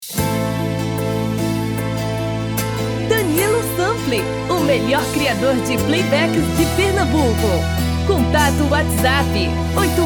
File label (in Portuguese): Forro das Antigas